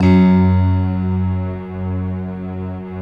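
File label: bright electric piano strings; Optical Media International - Sonic Images Library; Slopiastring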